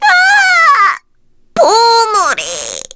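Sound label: Vox Dead, Korean; Miruru; kingsraid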